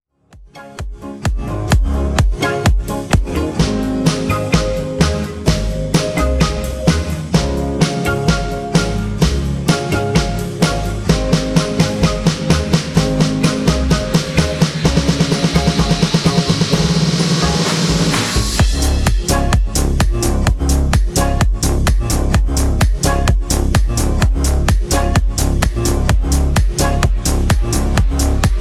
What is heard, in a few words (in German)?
Db dur; komplett